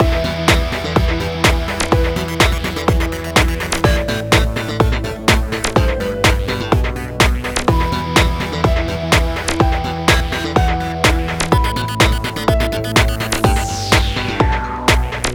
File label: BGM Scene Waiting room; Toy Attack; Animoca Brands; Android